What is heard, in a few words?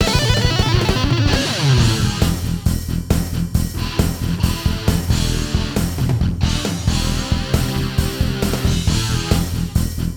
Goal; 18 wheeler american pro trucker gamecube